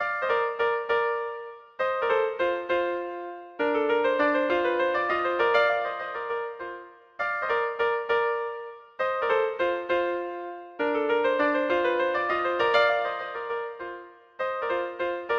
trumpet duet, menuett

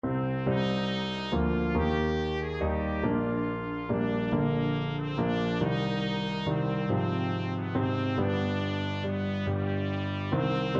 what child TPT, trumpet

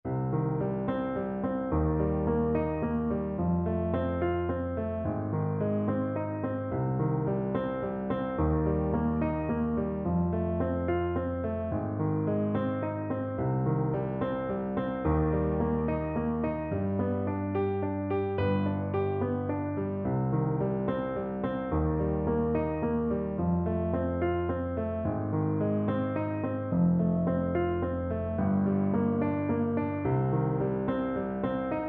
Baritone Saxophone

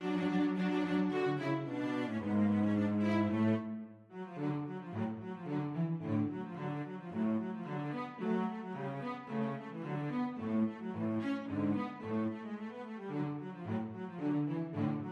march, cello duet

